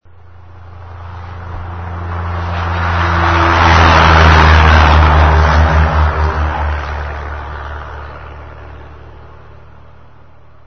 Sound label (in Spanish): avioneta